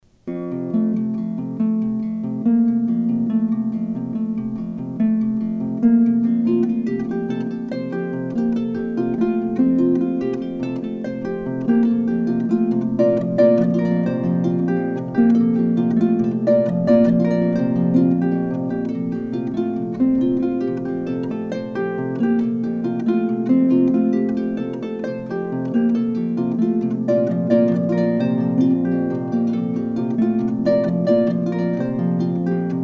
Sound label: Spinning Tune